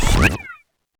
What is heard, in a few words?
Grabbers pickup; LittleBigPlanet 2; PS3